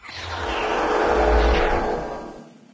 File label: cloak